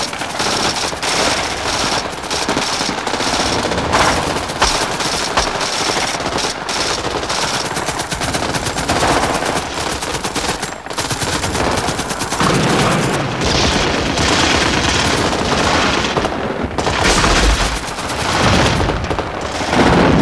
combat, ambience

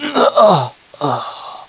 barn die

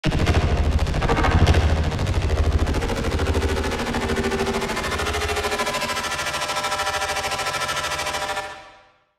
Alien Com

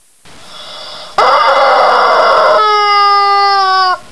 chick attack strong, chicken

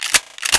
laser rifle reload

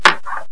lighter pickup; buttons

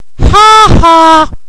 cha cha

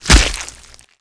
meat tongue

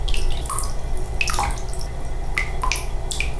cave drips

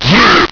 blow

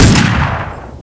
fire change, weapons

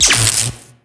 electro; diekrypta

